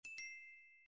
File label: chat